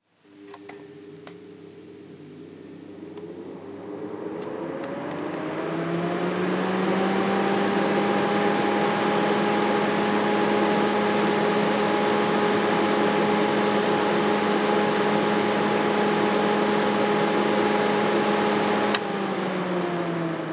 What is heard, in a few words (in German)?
Luefter